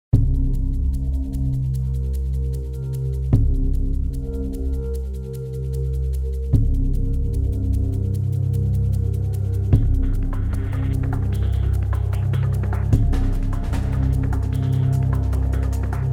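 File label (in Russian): минута ожидания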